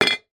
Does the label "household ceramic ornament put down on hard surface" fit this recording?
Yes